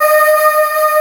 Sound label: CHOIR G#4; GOTHIC; East West; Global Sounds